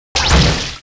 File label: Metal elf Archer attack